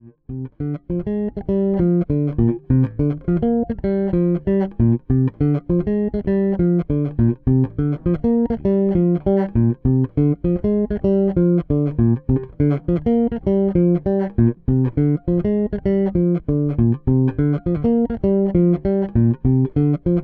Sadowsky NYC Jazz Bridge Pickup passiv

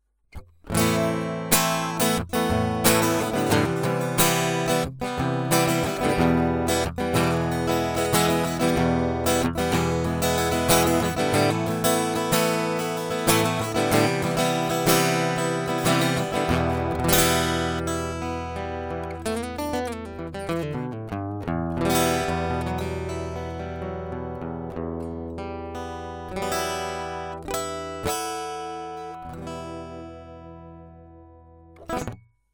Gitarre; UA Apollo Twin

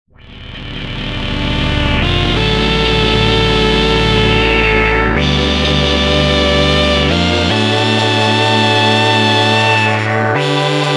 Dreadbox Polyend Medusa Unisono Akkord

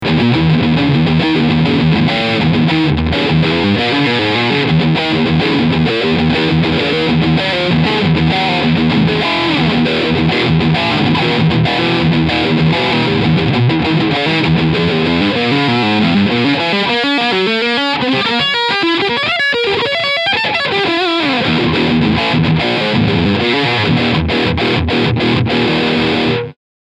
Kramer Jersey Star E Gitarre Overdrive Riff Steg Pickup; Kramer Guitars

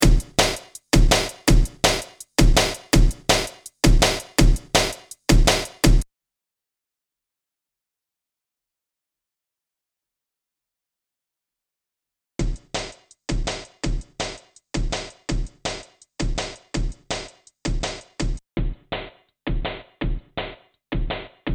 Drum alle

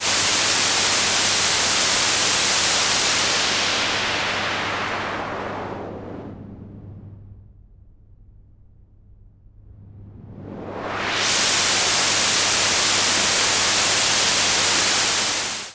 Filter Weißes Rauschen LP; Bastl Thyme

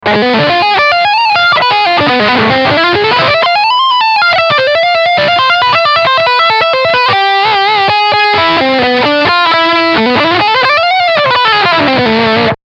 Leadsound Steg HB

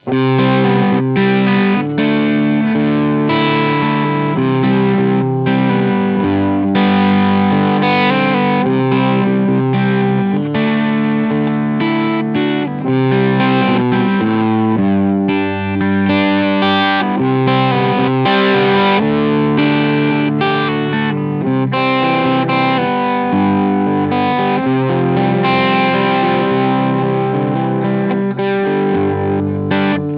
Gain 12 Uhr EQ 15 Uhr

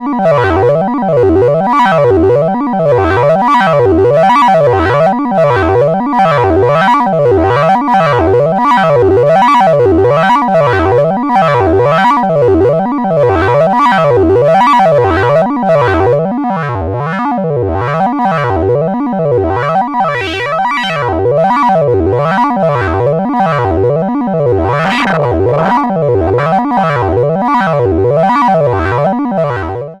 SH Noise VCO schwellendes Arpeggio; GRP Module

